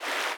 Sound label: fast water, river sounds